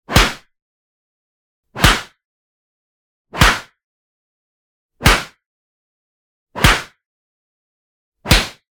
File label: PUNCH Soft; boom library; Anime Essentials